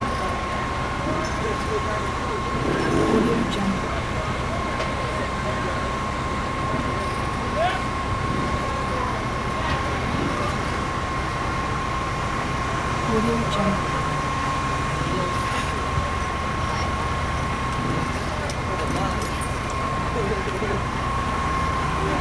fire scene exterior trucks idling power tools

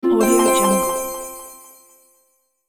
spell of fortune

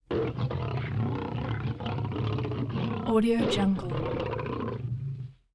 lion big guttural growls snarls

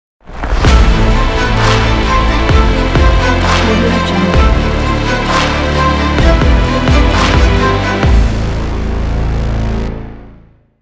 the epic logo

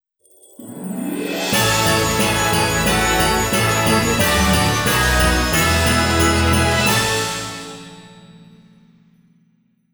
christmas orchestra logo

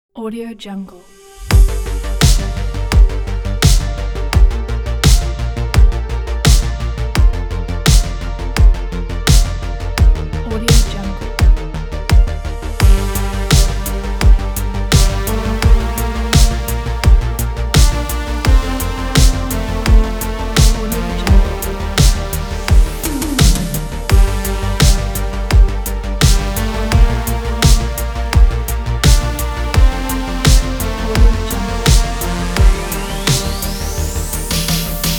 synthwave

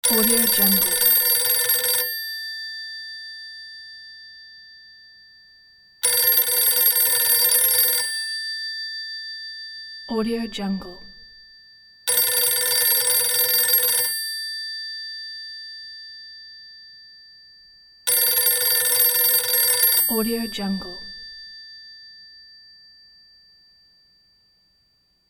old telephone dial phone ringing

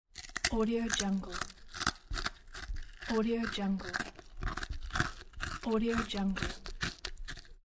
crunching crackers

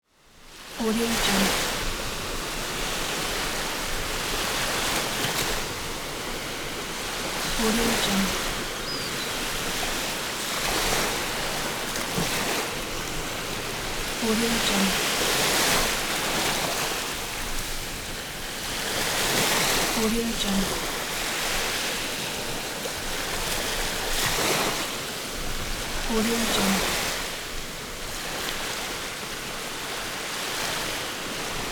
sea shore ocean beach seagulls; wistansound

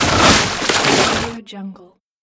jump into water

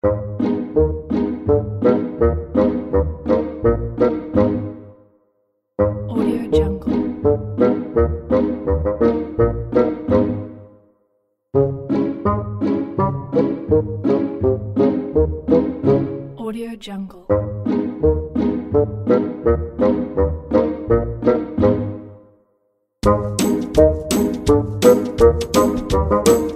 funny comedy spy; eitan epstein music

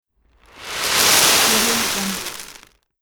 seed slide soft surface short

